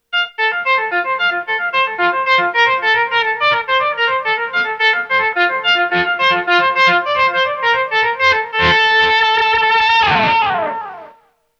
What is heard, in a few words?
Violining